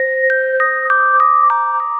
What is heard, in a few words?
deep electric piano